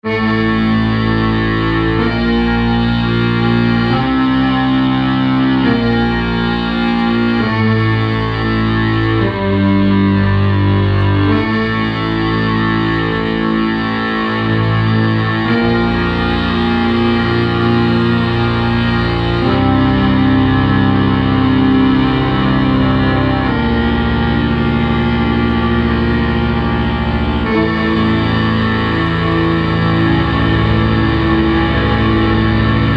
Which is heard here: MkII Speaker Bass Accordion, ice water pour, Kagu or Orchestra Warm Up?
MkII Speaker Bass Accordion